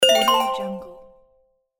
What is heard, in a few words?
happy point combo bells